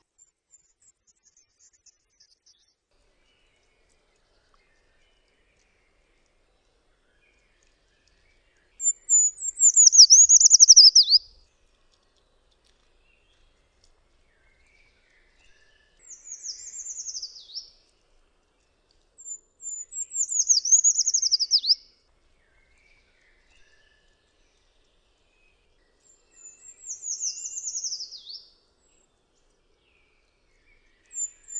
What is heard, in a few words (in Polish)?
PELZACZ LESNY, birdsounds